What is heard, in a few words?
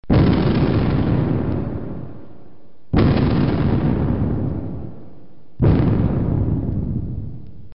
Mortar Explosions X3